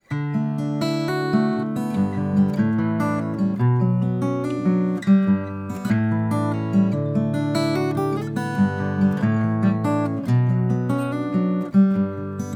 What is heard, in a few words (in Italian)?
Telefunken V672 Arpeggio Acustica